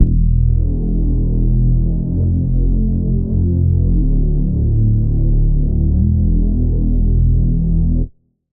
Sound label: Reese; Front Row; Routed Sound; Spire Expansion; Street Vanquisher Vol.1